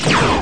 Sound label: Photon Torp